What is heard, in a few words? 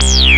Industrial Analog Single Notes Short